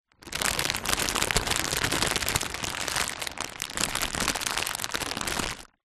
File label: food potato chip large packet movement; zapsplat